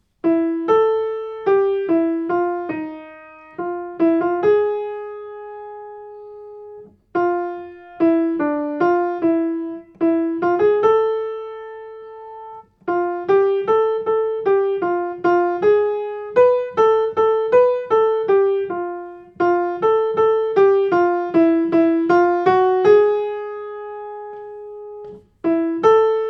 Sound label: piano; narishe tates 2e piano